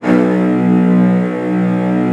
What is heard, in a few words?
CELLOS C#2, Synclavier Strings; ILIO